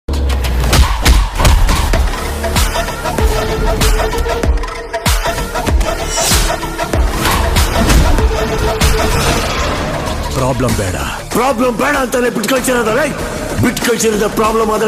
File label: entry bgm ringtone; max movie; max climax movie